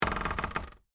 Door Creak Wood, Foley, Door, Creak, Wood

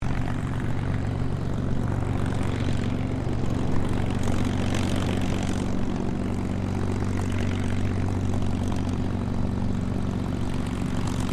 AIRCRAFT CA-18 MUSTANG TAXI SHUTDOWN STEREO; P51 /CAC-18 Mustang; Airplanes

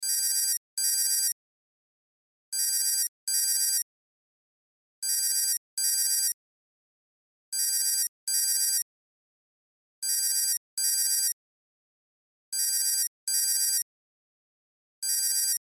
Cell Ringtone

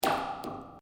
Metal cable strike; Material components to create more complex sounds; Materials

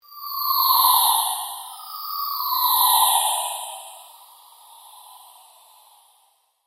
PULSE LONG DOUBLE SWEEP; Sound effects created by manipulating other sounds; Sweepers; Sound Design; Foundation4

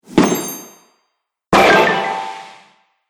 Firework Magic Twin Pack; Magic Sound Effects

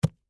Bible Place Hand, On, Book; Foley; Book Sound Effects; Offices; Blast Wave

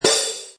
Cartoon Cym Clash, Comedy fall impact, cymbal hit; Comedy/Cartoon CYM CLASH

